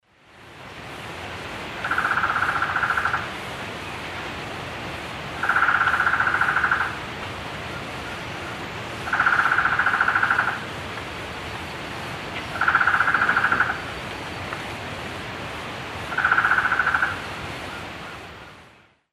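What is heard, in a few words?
Trogon collaris, call playback; S. America; Eastern Lowlands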